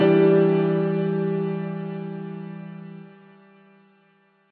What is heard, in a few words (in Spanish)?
Acorde pianos